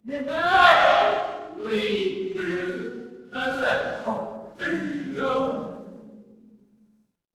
crowd cheer

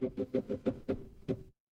mechanical engine

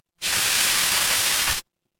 water stream

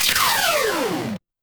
retro game laser

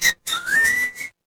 feedback success

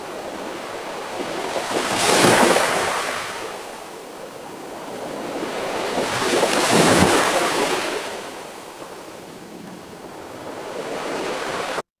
ambience ocean

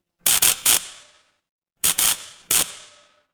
fire electric arc

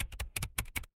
office keyboard

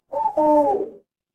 animal owl

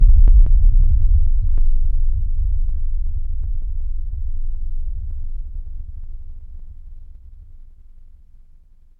horror heartbeat

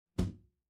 impact thud

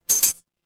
door doorbell

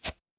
ui swipe

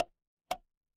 cartoon bonk

